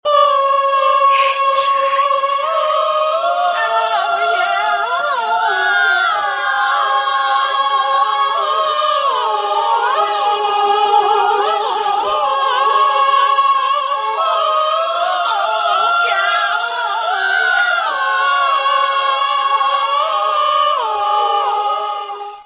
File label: ahh aaaa heaven